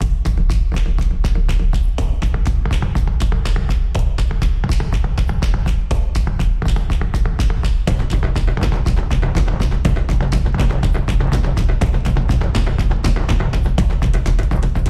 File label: Drum Solo; stomps and claps